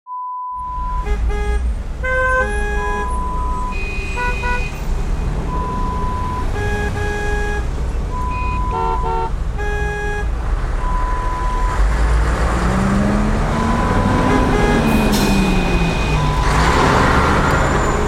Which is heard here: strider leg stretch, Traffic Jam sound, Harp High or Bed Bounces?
Traffic Jam sound